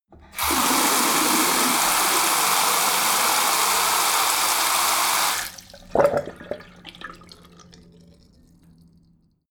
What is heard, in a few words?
tap flow ultra hard short, badezimmer